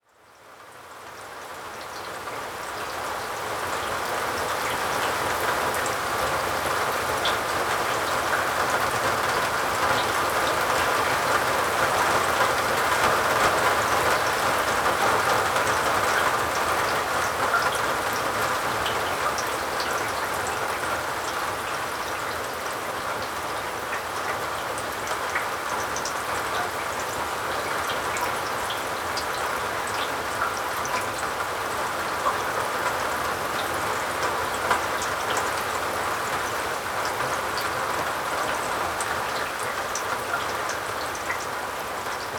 Rain Sounds

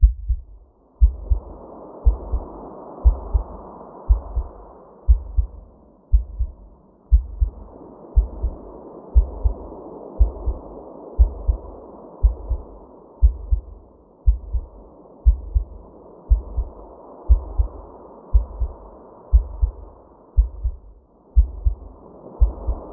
White Noise, Womb Sounds For Baby

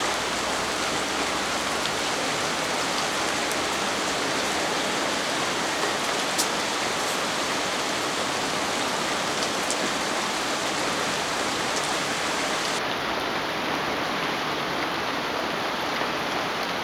Binaural Beats Brain Waves Isochronic Tones

